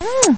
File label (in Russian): poceluj s zhenskij golosom korotkij